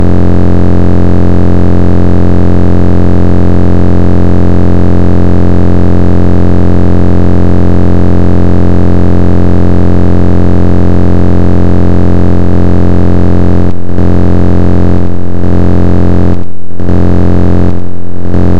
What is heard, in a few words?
double pulsar sound